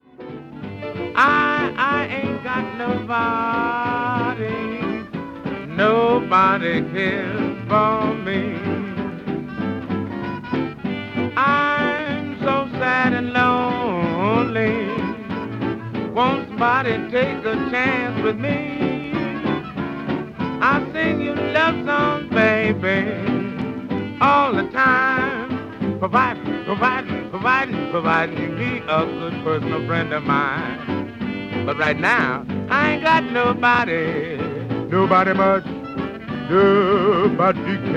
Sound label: sing speak